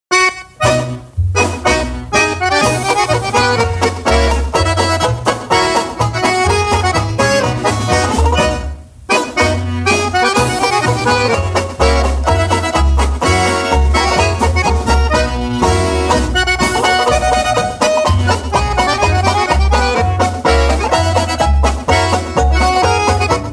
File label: Strabane Polka, accordions